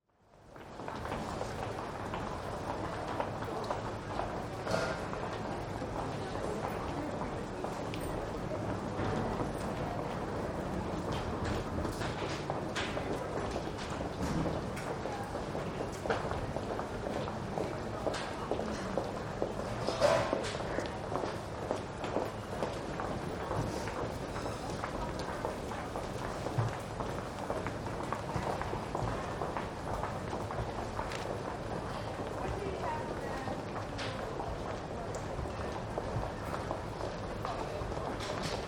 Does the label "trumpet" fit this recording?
No